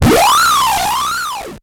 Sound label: Transition Digital Distortion; Production Element Title Transition